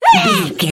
voice fun small character emote angry

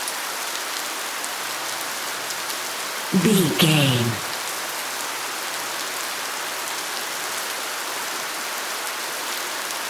rain heavy loop

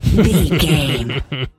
voice fun man character deep laugh

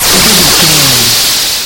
retro spaceship jet boost

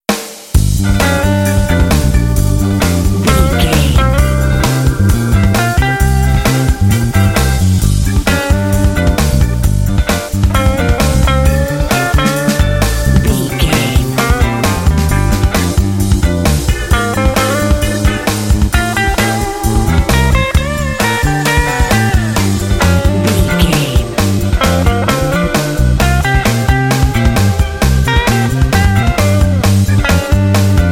get crowd

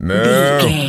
cow moo

